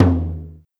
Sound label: Tom Low